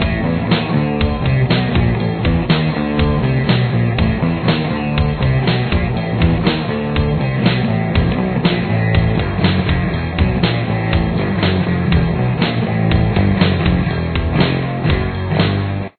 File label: space chorus